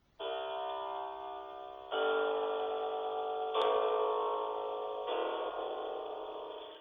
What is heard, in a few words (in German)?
Gralsglocken klavier